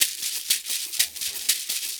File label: 120 SHK